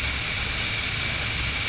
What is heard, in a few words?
ENGINES; PC Gamer IT CD 47; USAF